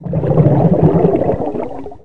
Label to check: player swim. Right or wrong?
Right